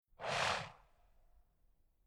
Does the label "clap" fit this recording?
No